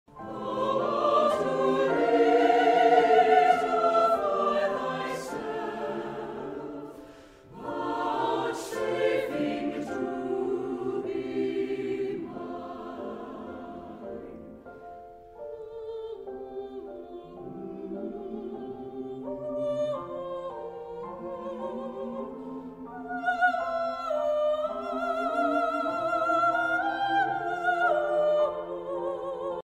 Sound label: Lullaby; ChristWorldClips